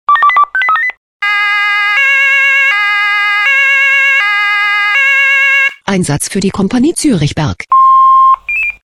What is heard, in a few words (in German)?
SMS Alarmierungstone; KP Zuerichberg